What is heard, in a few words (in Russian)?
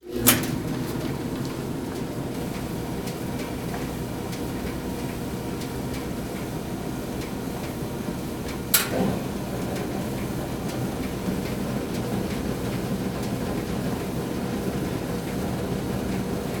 Sushilka dlya belya